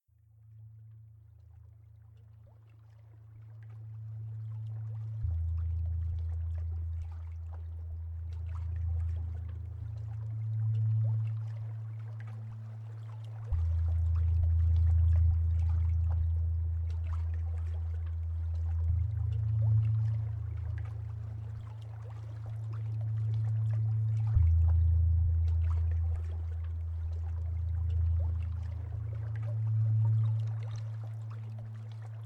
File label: Thetis Ambient; junk jack mobile